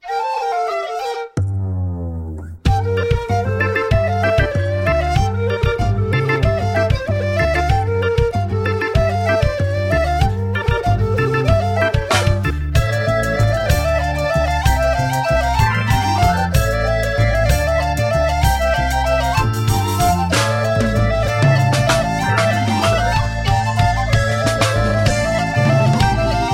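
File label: Instrumental, Celtic Reggae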